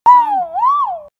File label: Great argus; پرنده آرگوس بزرگ